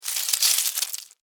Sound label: Insect Run Stop, Insect, Run, Stop, Slide, Movement, Bug; Blastwave; Animals:Insects